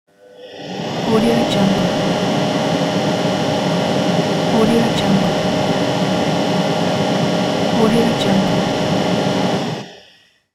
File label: fan